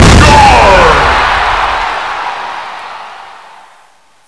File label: soccer exp; zmall